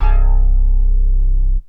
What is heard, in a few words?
Synth hits and riffs